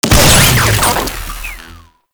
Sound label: Lure Deactivate; Warframe; Teralyst; Vomvalyst Lure